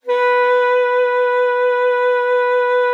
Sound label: B4, ALTOSAX; Xsample VOL-2